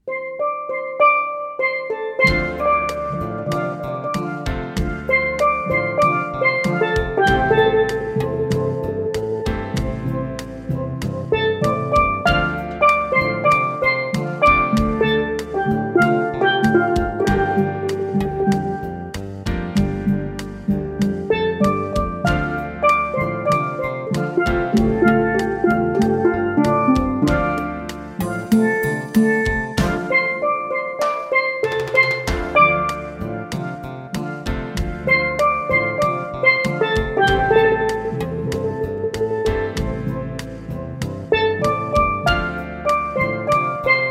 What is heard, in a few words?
steel drum band